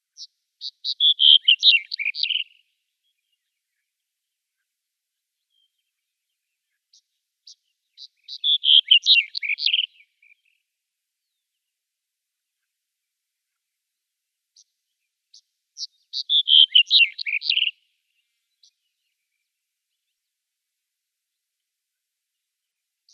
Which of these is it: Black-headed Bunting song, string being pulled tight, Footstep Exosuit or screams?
Black-headed Bunting song